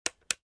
flashlight turned on; fnaf 4

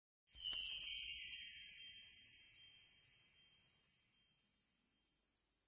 glitter sound

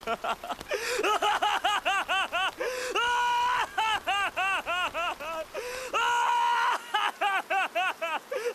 laugh; cid

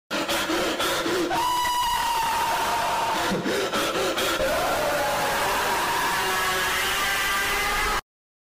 heavy breathing scream

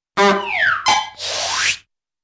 honk whistle slide down klunk and suction

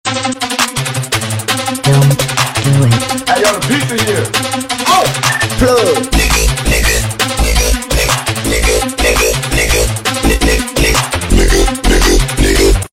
tuff beat

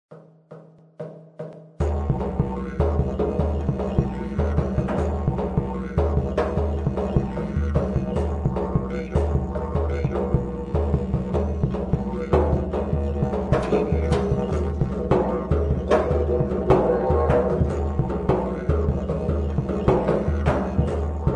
robot band

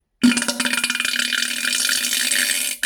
nuclear bomb fart

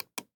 left click sound; bandicam

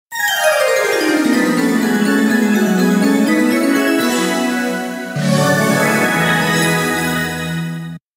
sega dreamcast startup

